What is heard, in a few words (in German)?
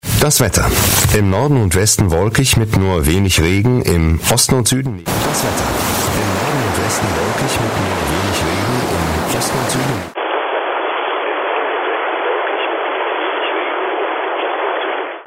Null Rauschabstand; starken Rauschen im Kopfhörer bei einer Sprachübertragung